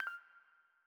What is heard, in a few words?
Classic UI SFX Short High; gameflow-deck